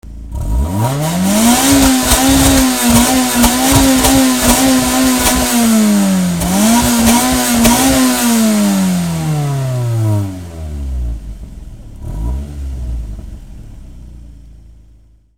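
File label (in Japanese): AE101カロゴンBZツーリング純正 レーシング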